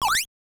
griffon on

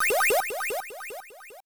quest complete

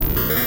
animate dead

